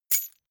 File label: key ring large catch; objekte; schluessel